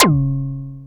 Anasound Short hi C#; Sonic Foundry (Sony Creative Software)

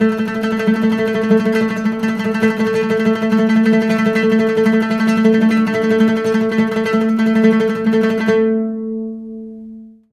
LUTH 2 A3, Arabian Traditions; USB Soundscan vol.43; AKAI

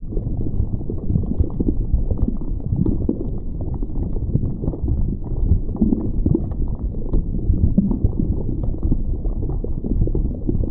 SUB BUB, lava atmo; Total Control - Action Cutz

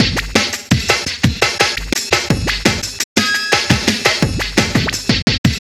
DJ Beat, Drum N Bass; Sonic Foundry (Sony Creative Software); Knutrix Drum & Bass

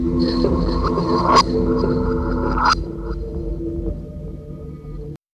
SI Fi, Drum and Bass Instrument Samples; EZ Rollers; Creeper

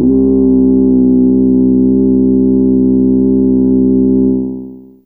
Ahh C2, Humans; Humans2

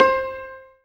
zimbal C 4; ZIMBALON

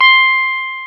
GTR, Jazz Guitar; Club-50 - Foundations; Jaz-Kessel

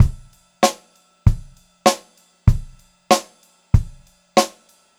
96 CL VARI 6, Pop-Rock Drumloops; USB Soundscan vol.37; AKAI; 90 s Sample CDs

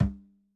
RACK TOM, ELECTRO KIT

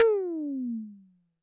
TD-20 Tom B, Roland TD-20 Toms

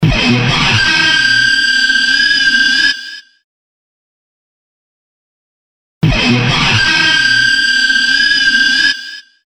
Screaming, Guitar

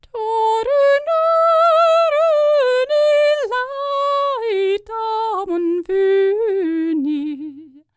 SCANDINAVI, World Voices; ILIO; Vocal Planet VOL-5

